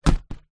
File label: oar down in rubber dinghy